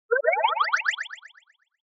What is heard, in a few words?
Motion Slide Slow Delay